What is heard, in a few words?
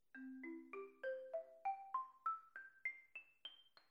Xylo